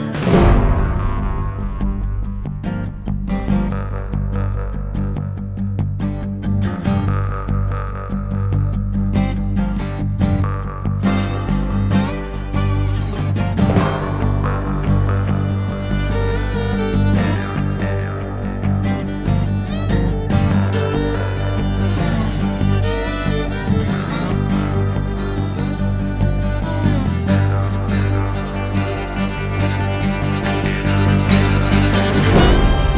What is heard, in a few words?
loop; The Horse Whisperer Press Kit